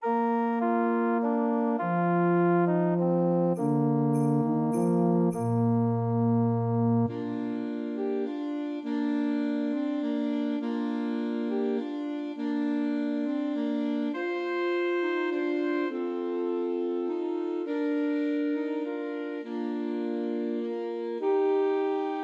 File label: Christmas Trios for Three Alto Saxophones; Silent Night